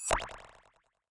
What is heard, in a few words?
appear; battle